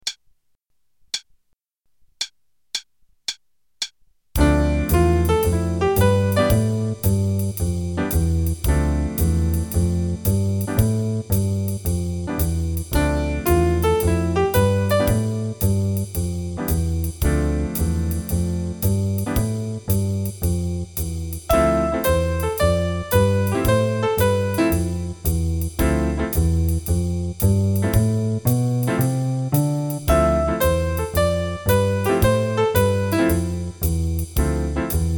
Improv D min with Jazz Rhythms qn=112; D min7 (D Dorian) (32 bars; Trading with Jazz Rhythms, Set 2; Jazz Improv Play-a-Long

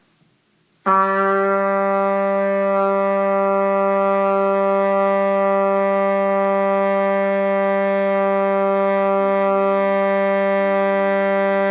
Long Tones Low A, Trumpet

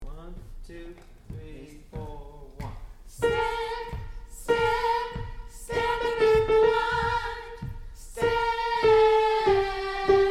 Soprano part

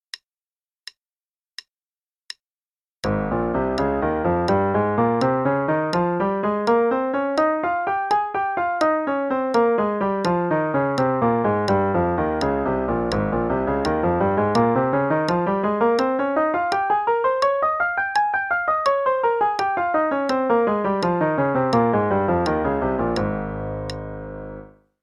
Ab Major, triplets then 16ths (qn=84); CM Level 6

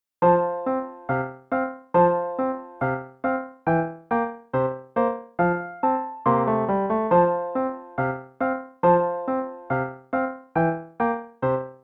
The Clock Shop (no click, both parts, qn=140); Level 1 Performance - page 06

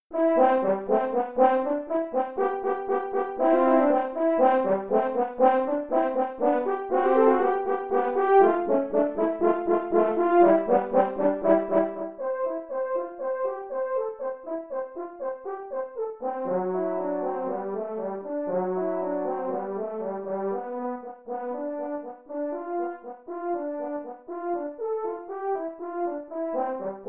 Easy Classic Duets Allegro, French Horn; Haydn

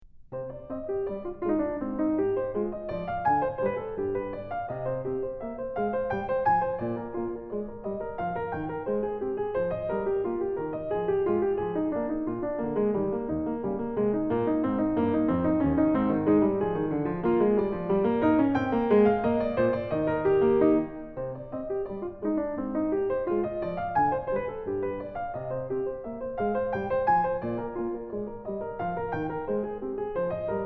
Short Prelude in C Minor; Magrath Book 08; BWV 934

